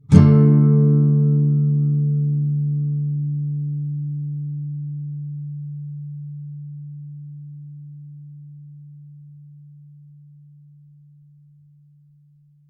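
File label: D minor bar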